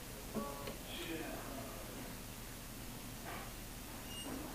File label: Oakley Guitar; oakley plantation